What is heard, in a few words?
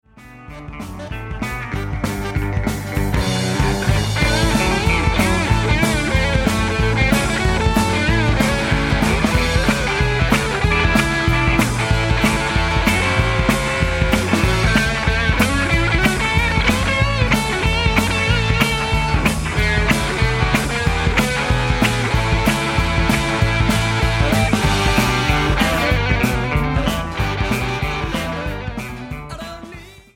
Playin solo